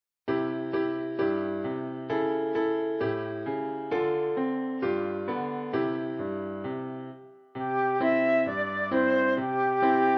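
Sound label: flute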